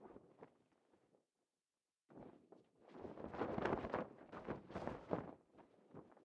ambient; World of Tanks

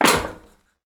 landing proflist; Escape from Tarkov

